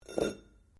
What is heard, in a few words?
METAL ON STONE SCRAPE